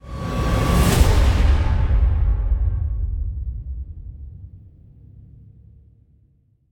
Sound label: epic whoosh intro; The Free Ones linux macos windows gamerip 2018